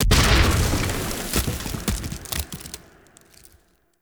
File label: Tree Dead Destroy; Objects; Destructible Trees